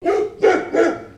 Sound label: dog bark close; ambient; creatures; Counter-Strike Global Offensive